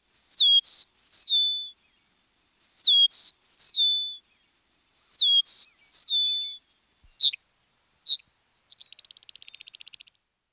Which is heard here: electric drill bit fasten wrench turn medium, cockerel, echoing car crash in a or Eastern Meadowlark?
Eastern Meadowlark